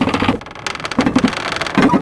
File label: load; de hl stronghold